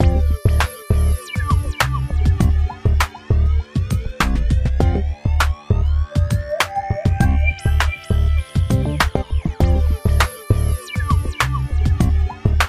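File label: Fairgrounds, 100 bpm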